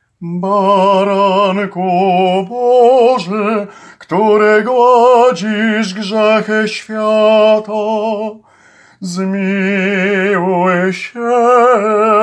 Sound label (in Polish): TENOR